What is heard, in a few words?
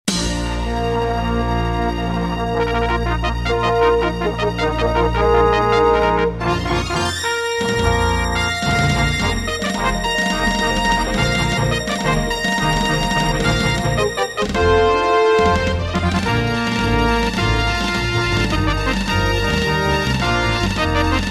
Intro, Casio GZ50M; Dark Forces